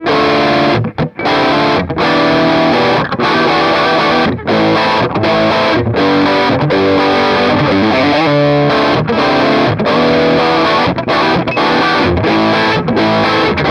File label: lead